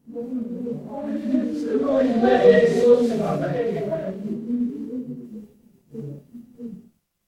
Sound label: crowd murmur